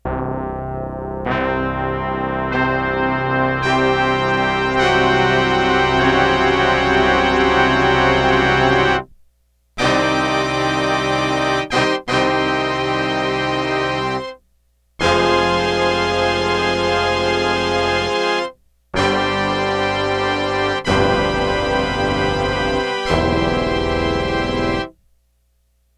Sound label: HORN SECTION 2 OCTAVES